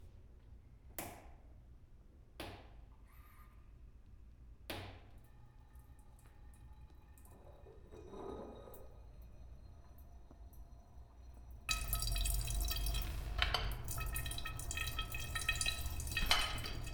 Wasser in die Flasche

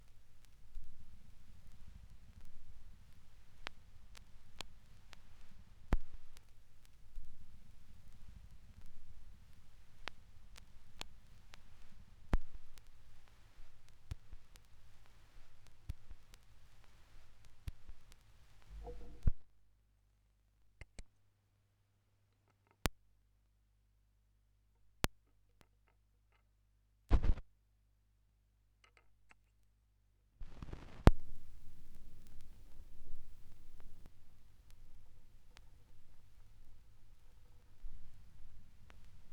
Schallplattengeraeusche, Vinyl Rip

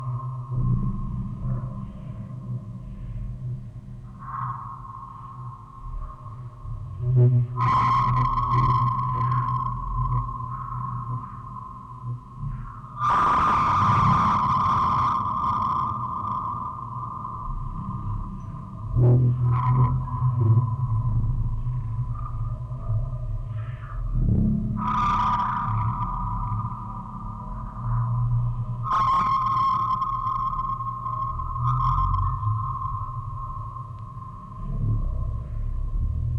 Aufnahme Tape out, Spieluhren-Quartett mit Tonband; Fieldrecording

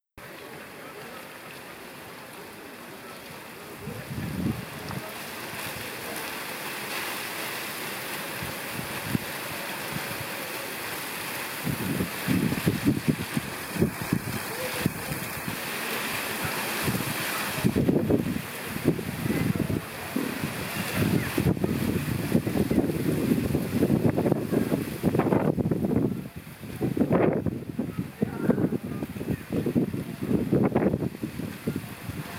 Sonnenuntergang und Wind auf dem Wasser; Curacao; Original Video Ton; Fieldrecordings

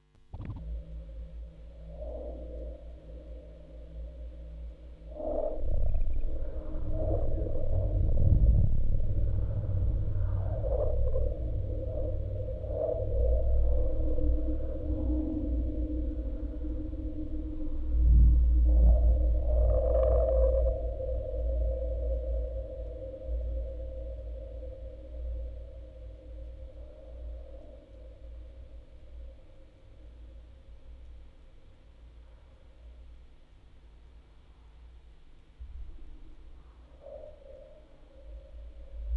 Aufnahme Tape out, Spieluhren-Quartett mit Tonband; Fieldrecording

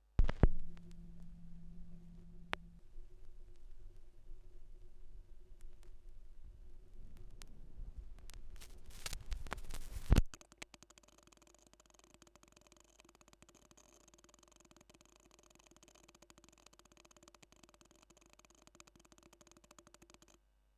schallplattengeraeusche, 45rpm; reverse

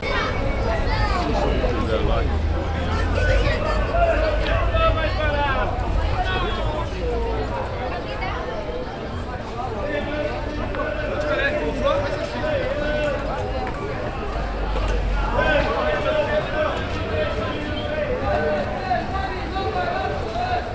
aufm Markt, Fieldrecordings Brasilien

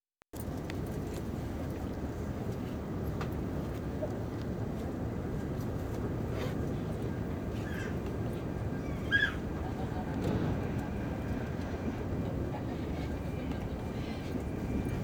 im Zug; Original Video Ton; Fieldrecordings; temporary rauschpartikel Studio 2 Haspelweg 1

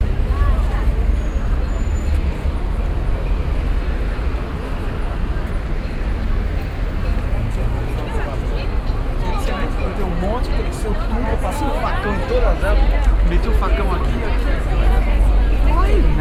auf m markt, Brasilien; Fieldrecordings

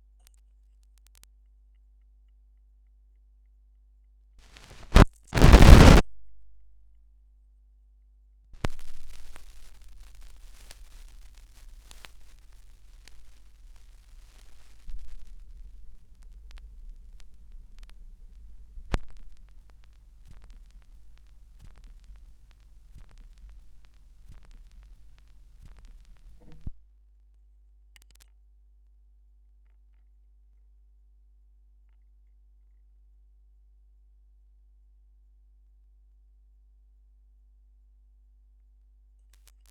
Schallplattengeraeusche, Vinylsingle

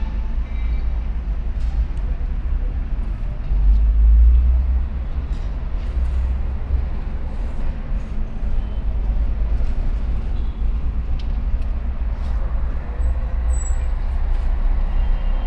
im Auto in Rio; Brasilien; Fieldrecordings

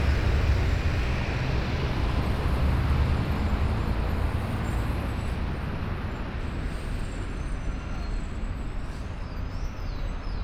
morgens St.Cecilia unterm Minhocao, Fieldrecordings Brasilien

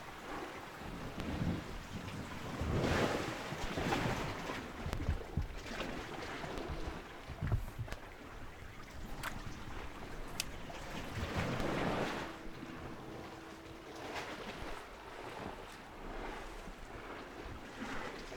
Wellen (Rode NT4); Curacao; Fieldrecordings

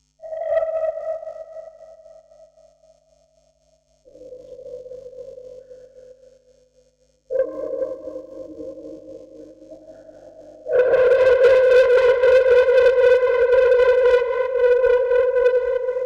Aufnahme Vocoder, Spieluhren-Quartett; Tonband; Fieldrecording

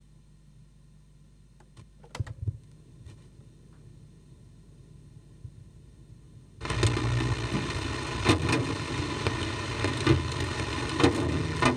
19 cm (Lautsprecher Tonband), Trommeln im Regen Tape Echo Mix

